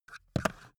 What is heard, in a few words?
puncher move